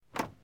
wooden small

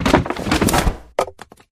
chairs drop

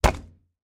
rooftile build

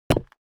brick Stairs

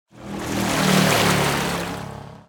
speedboat; vehicle

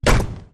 block, Stone

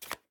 fishing switch rod

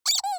duck toy

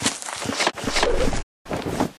flower

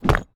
brick light; stone

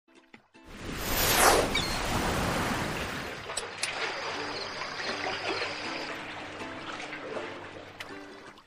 dockside Bar night

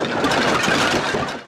tiles; stone

